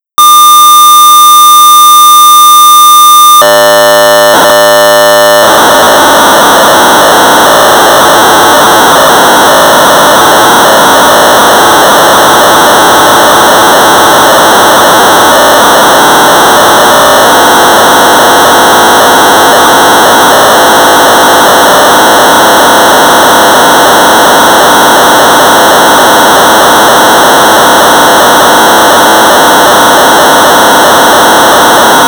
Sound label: Sorcerer 1200baud; Eliza; BASIC